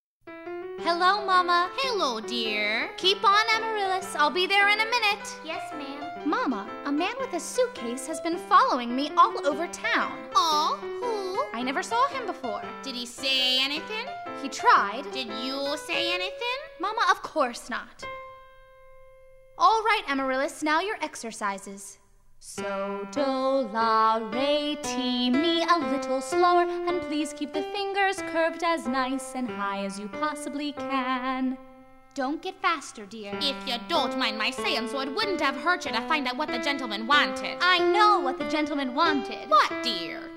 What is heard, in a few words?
piano lesson